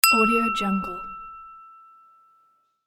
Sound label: ting